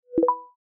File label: voice earcon listening